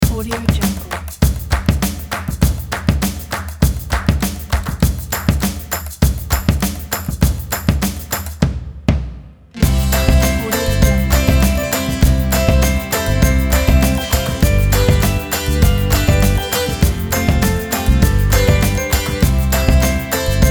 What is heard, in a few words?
uplifting positive; worldmusic